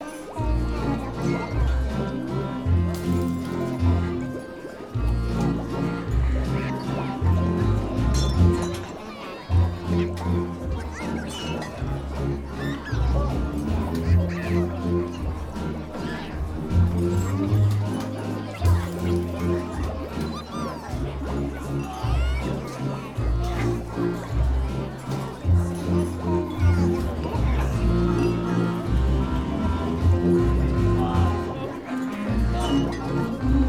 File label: Tavern Downbeat Stereo; Sogport